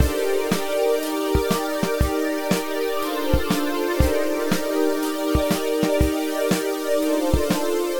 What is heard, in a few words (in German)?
pad ohne duck; softube valley people dyna-mite